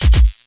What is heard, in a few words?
light sword dual